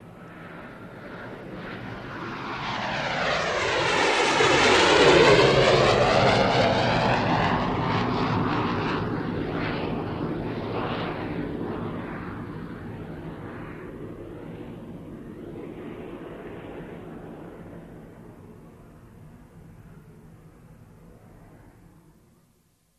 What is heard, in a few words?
Jet Take Off, land & takeoff